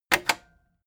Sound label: cassette eject click fast heavy